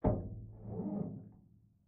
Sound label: thump squeak distant; Zomboid 41.78.16; Project Zomboid